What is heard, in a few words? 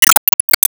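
Battle Assist On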